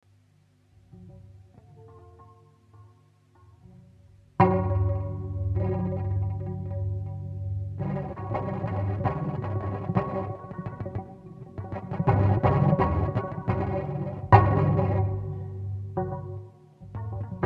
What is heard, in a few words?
bass